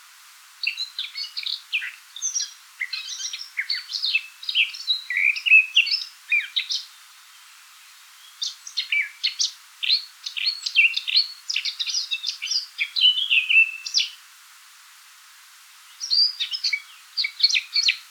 call, Blackcap, The bird utters some long subsong phrases and in two cases ends with a fluted song; sylvia atricapilla